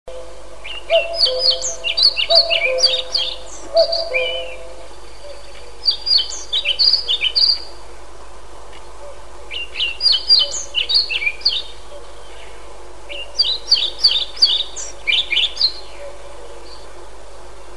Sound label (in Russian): mukholovka pestrushka